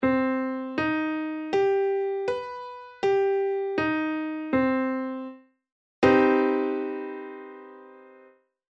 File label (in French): min maj7, accords de septième